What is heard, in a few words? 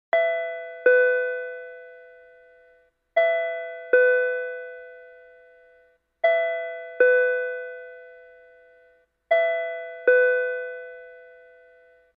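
sound of an intercom in an apartment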